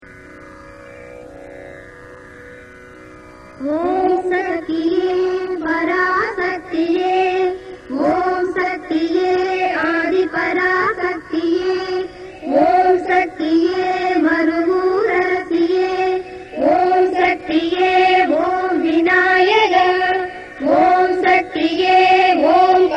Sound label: moola mantra